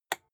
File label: rotary control single turn hard